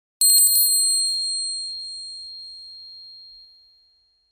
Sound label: bell